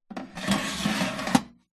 household air fryer oven metal grill tray place inside slide in